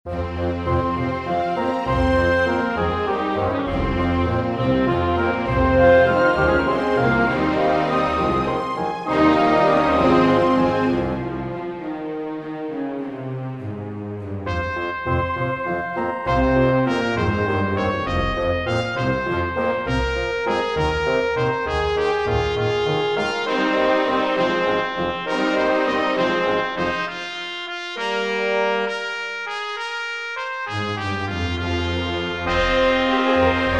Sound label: walnut dance